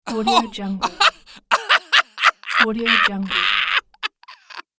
laugh male

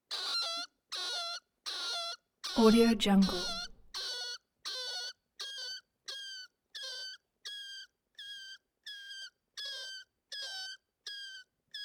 rat squeaking stuck on carpet tries to escape

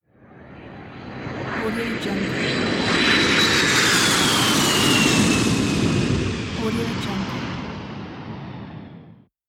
jet airplane

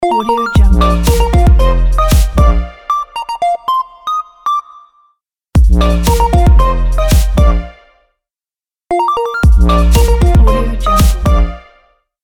positive dynamic logo

